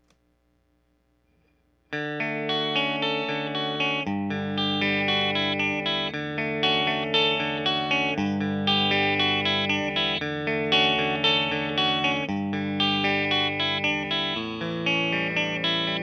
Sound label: lp channel 2